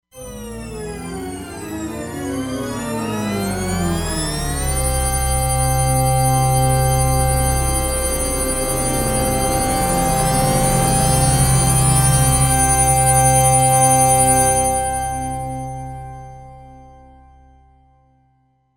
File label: Bending Logo, Roland Fantom XR